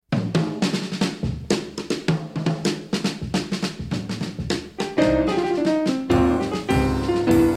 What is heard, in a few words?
drum intro